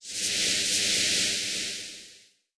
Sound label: Powder